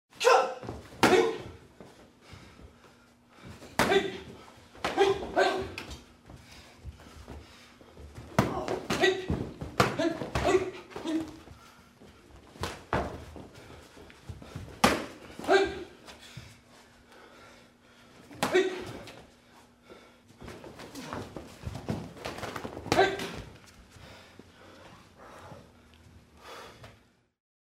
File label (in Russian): draka karatistov